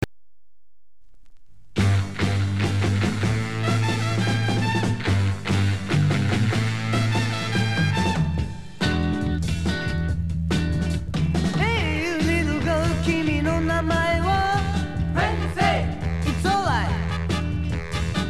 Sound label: hahaha